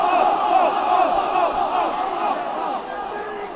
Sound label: off off off, chants